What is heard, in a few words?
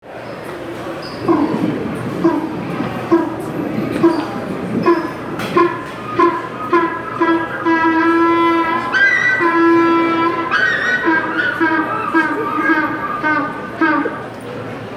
african penguin